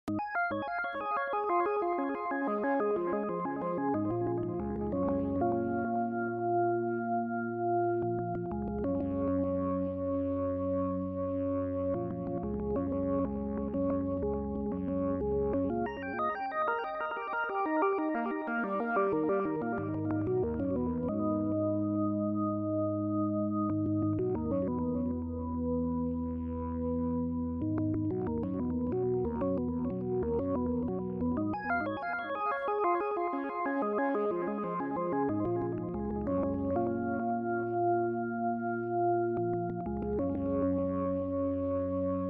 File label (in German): TC2290 DT Orgel PP Strokes